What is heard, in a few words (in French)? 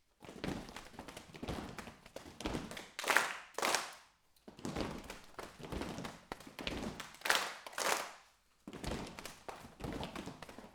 Percu corpo